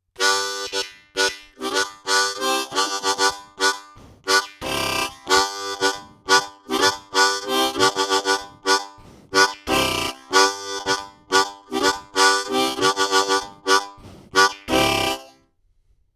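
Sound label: Shure SM57 rhythm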